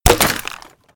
hit crates; Henry Danger - Day Of Danger!

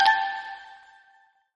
jump; Looney Tunes - Recess

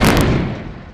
Hit; Teen Titans go! H.I.V.E. 5 Robin Vs See-More

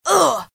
hit; Adventure Time - Break The Worm

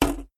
hit block; Nick Summer Sports Stars

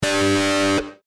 fire guitar; Adventure Time - Elemental; ThrillGames

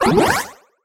time bonus; Ben 10 - Cannonbolt Smash

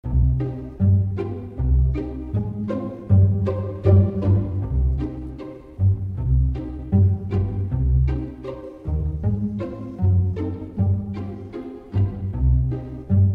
win game; Adventure Time - Break The Worm; ThrillGames

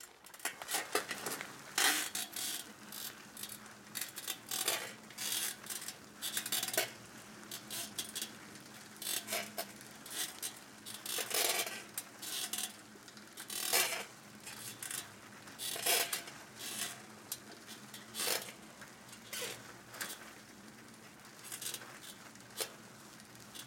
bike ride sound; Beano - JJ's Wheelie Big Challenge

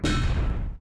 land heavy; Ben 10 - Hero Time Game

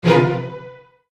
jolt; Looney Tunes - Avalanche Snowboarding

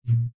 interface over; Adventure Time - Break The Worm; ThrillGames

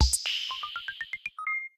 music gameover music sting; Adventure Time - Angry Betty; ThrillGames

